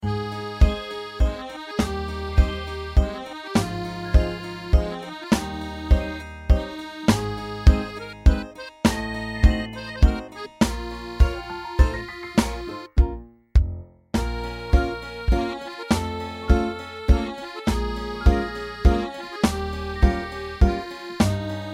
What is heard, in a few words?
3/4 waltz